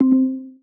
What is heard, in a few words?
Siri Activate, AirPods